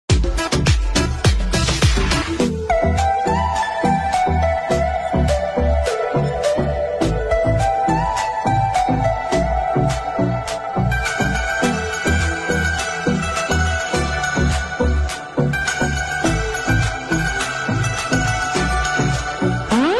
beautiful instrumental ringtone instrumental ringtone best mobile ringtone